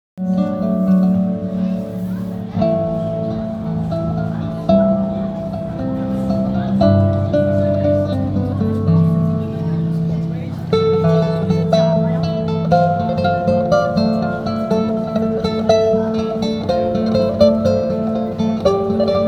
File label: Guitar Player St Malo; Field Recordings